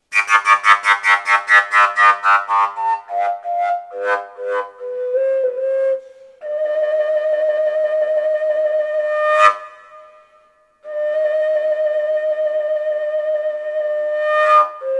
collapsible g, fujara; folkart slovakia

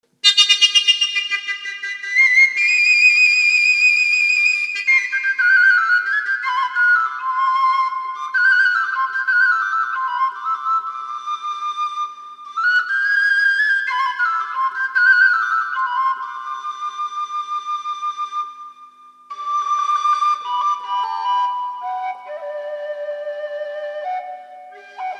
double pipe, whistle

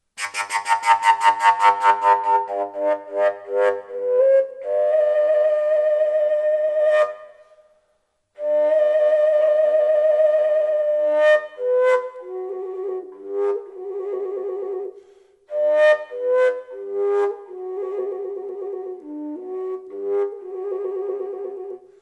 fujara plain elder; natural fujara

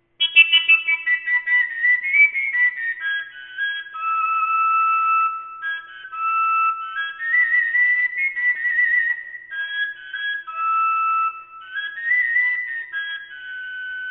koncovka e, overtone flute; whistles

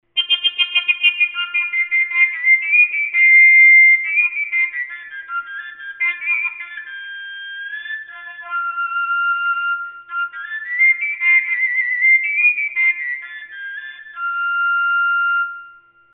koncovka f, overtone flute; whistles

